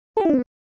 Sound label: animal crossing decline